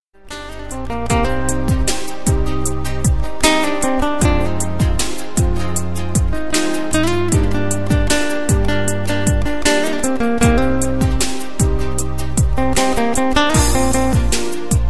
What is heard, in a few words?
guitar for love instrumental ringtone